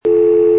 dial tone

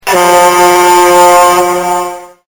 boat air horn

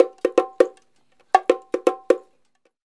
PERCU

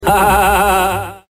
haha; elmer

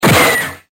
plaser

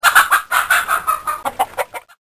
Chickens

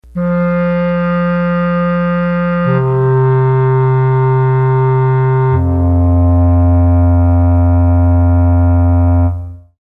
clarinet

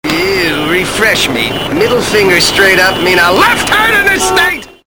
Left Turn

